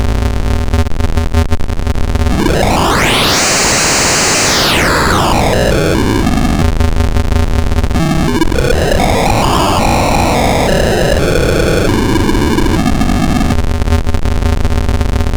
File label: SID noise